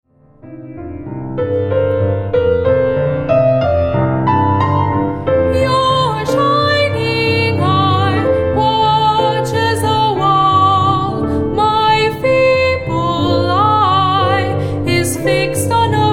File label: High School Soprano; The Monk His Cat; Barber